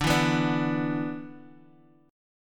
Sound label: B-Minor Double Flat 5th